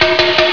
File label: tap tap tap